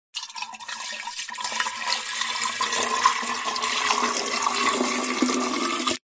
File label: foley liquid water pour into metal canister